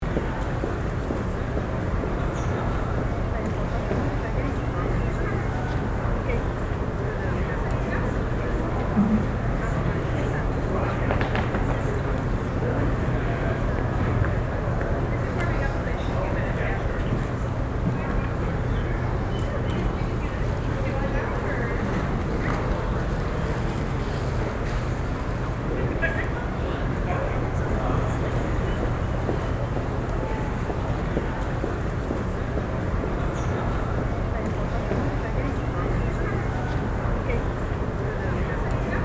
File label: Train platform empty distant activity; station